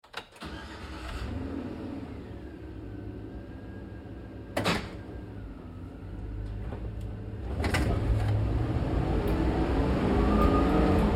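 Truck GMC Savana Interior Drive Accel Decel Med Fast; Trucks; Big Room Sound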